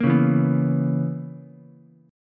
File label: B Major 7th Suspended 2nd Suspended 4th B down Ukulele; BM7sus2sus4; strummed; JGuitar